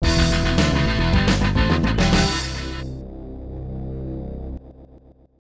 Winner; King of Fighters 2002 Challenge to Ultimate Battle; Neo Geo; arcade

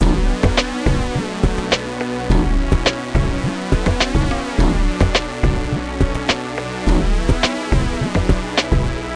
Hiphop; Learn to Fly 2

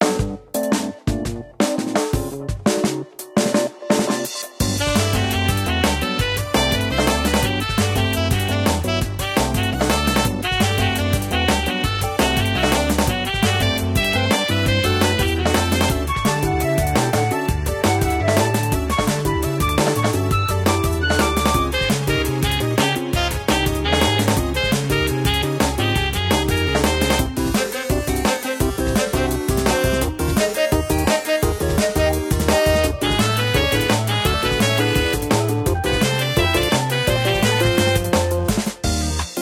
race

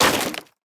Break Wood Impact; boomerang fu

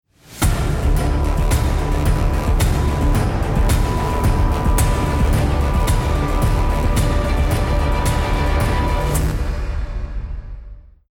bomb ten sec count

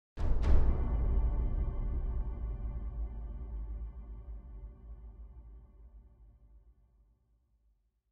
Citdel Big Drums; Frozen Flame; windows

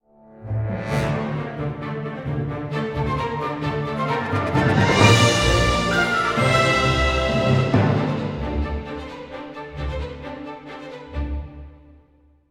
Hatchery Music; Jurassic World Evolution 2; PS4; Windows; Xbox One; Xbox Series X/S

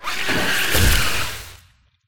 Jumpscare cymbal; Poppy Playtime; gamerip 2021

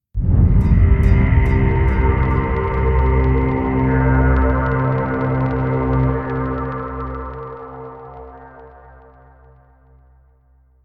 Mission Music Mystery Stinger; starcraft 2 wings of liberty

